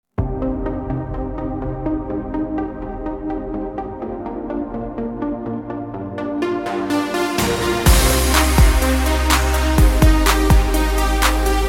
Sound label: instrumental, persian